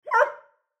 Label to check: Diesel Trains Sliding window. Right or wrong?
Wrong